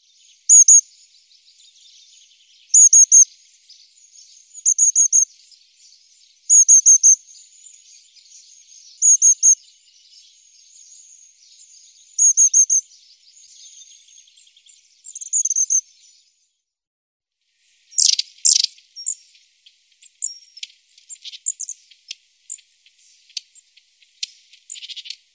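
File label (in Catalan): mallerenga cuallarga; Aegithalos caudatus; Cants; ocells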